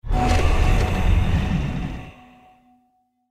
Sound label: merman win; Champions of Poseidon